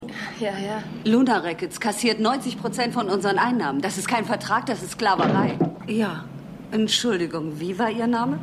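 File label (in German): Bell Sängerin; A-Team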